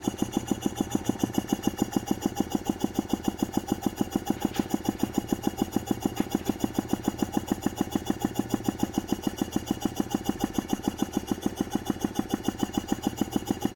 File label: barge air compressor steady, diesel; Pole Position